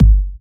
tight steel kick drum sample g key, Royality free kick sound tuned to the G note.